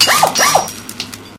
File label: upgrade metal